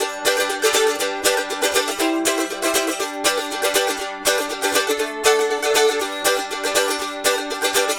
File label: Mandolin Rhythm; Continental Drift Aftershocks